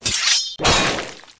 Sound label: slash; shedletsky